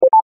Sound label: click